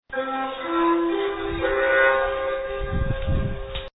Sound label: music horn